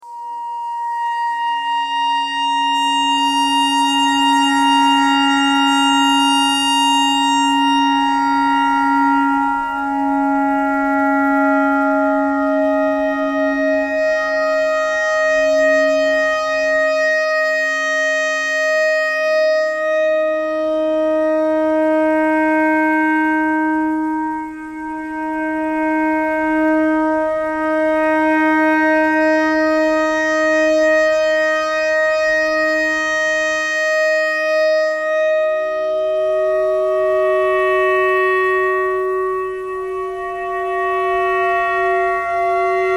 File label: clarinet clarinet notes e thread pentatonic